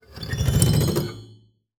GLISS, SPINET; Xsample VOL-7

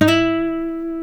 E 4 nylon, Guitars

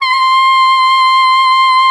C6, ALTOSAX; Xsample VOL-2; 90 s Sample CDs

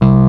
SUITCASE, Electric Pianos; E-MU Formula 4000 Series Vol. 3 – Analog Odyssey

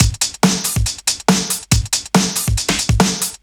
Break Beats, 140 BPM

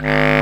WND Lo Clarinets, CB Clarinet; Roland LCDP04 Orchestral Winds

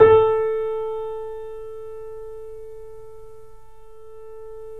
3D Pianos, Boesendorfer

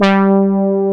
JX BRASS, Jupiter Synths; E-MU Formula 4000 Series Vol. 3; Analog Odyssey

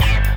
band hit; Sonic Foundry (Sony Creative Software); Synchro Funk; One Shot Sonics

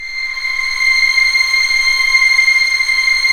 STR, Vls p Orch; Roland L-CD702; Vlns 7 Orch

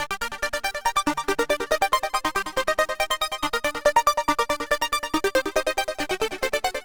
Arp 140bpm G, Trance; Zero-G Trance Inducer; Arps 140bpm; 90 s Sample CDs

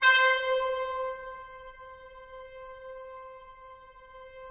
brass C5 L, Yamaha VP-1; Music Store; 90's Sample CDs; OKT.BRASS

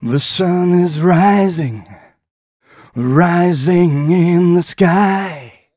130 mel g, Vocals

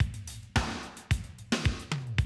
110, Classic Drum Loops

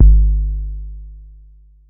Bass Kick, Jomox Airbase99, Drum Machines

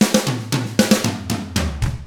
ROCK

